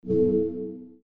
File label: menu open